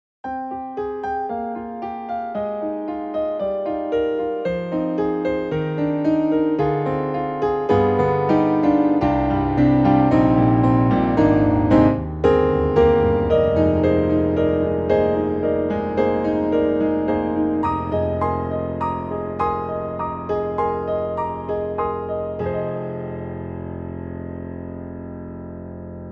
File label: YAMAHA MOTIF-RACK XS, Mellow Grand Piano